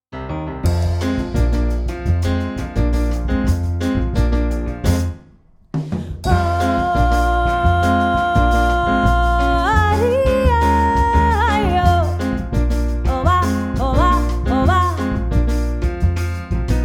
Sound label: Latin Singer